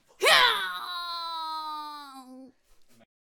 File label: Death Vocals; Swordcaster